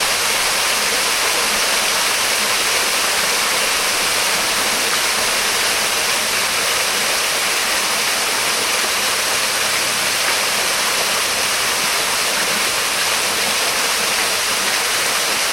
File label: waterfall small; sound effects one